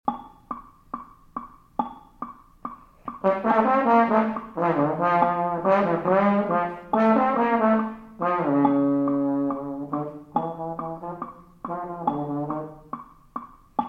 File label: jazz trombone latin